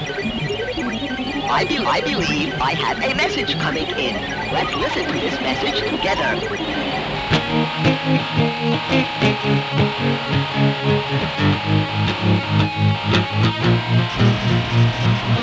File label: blues; 2xl